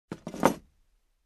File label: Put object on desk